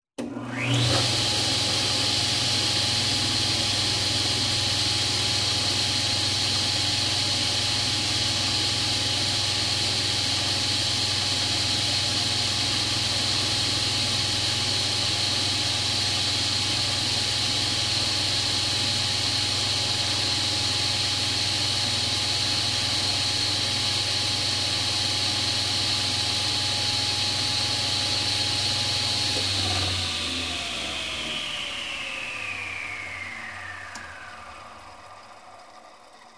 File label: traffic